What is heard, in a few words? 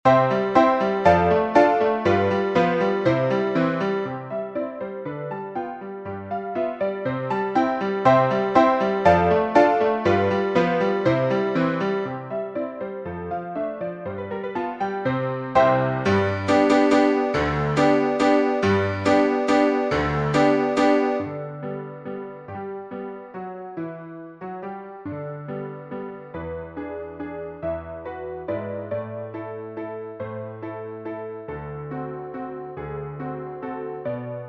piano, sheet music